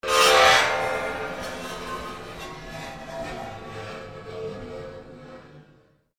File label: scratch